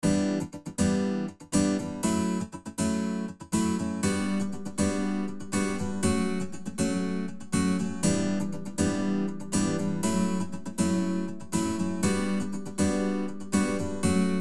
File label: Lexicon Plate Guitar